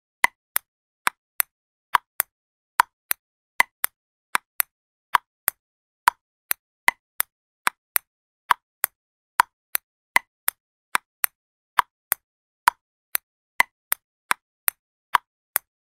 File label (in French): tennis de table; sound effect